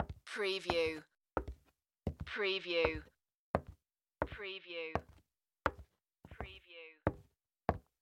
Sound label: Footstep Wood Walk